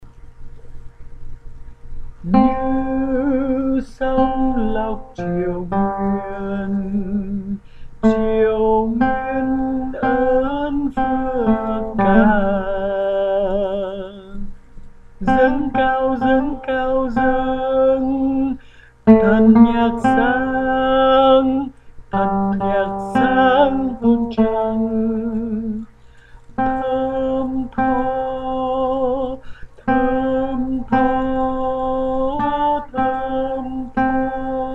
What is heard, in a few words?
Tenor